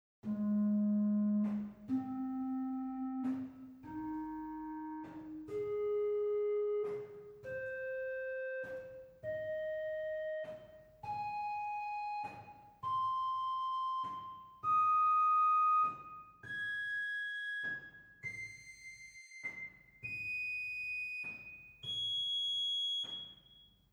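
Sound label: Nassat arpeggio, organ; Reinhardtsgrimma; typical Silbermann middle-sized church organ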